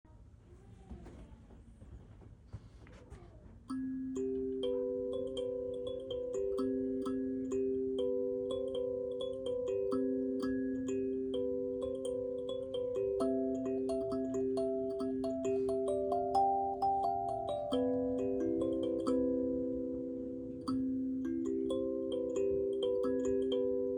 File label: Sansula, kalimba